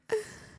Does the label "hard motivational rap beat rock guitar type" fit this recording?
No